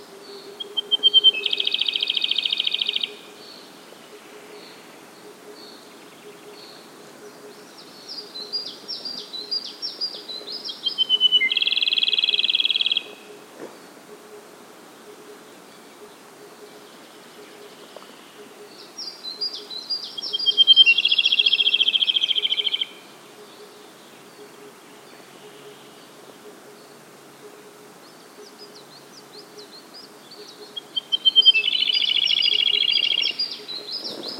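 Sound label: Siberian blue robin voice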